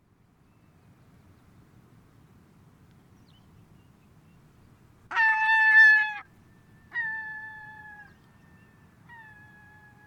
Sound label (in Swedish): Bredstjartad labb; Pomarine Skua; Stercorarius pomarinus; Lauri Hallikinen